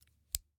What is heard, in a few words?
Scissors, snap, snip, shear, small, single; Eiravaein Works; Vaeyan IV